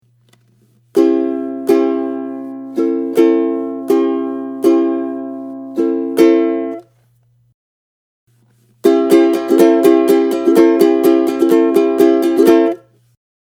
Strum, ukulele

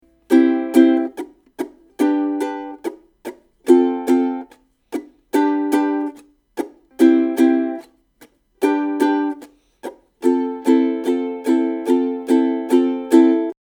Chorus Strum, ukulele

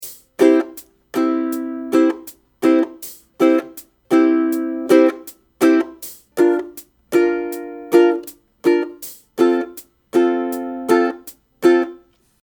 Strum, ukulele

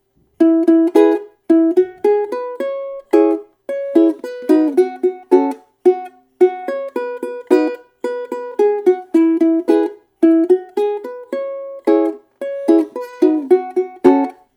Intro One Uke

